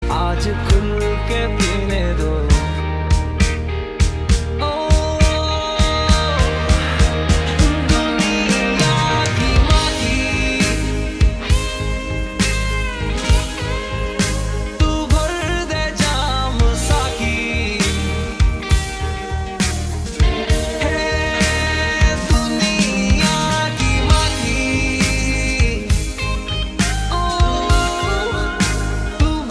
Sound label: Ringtone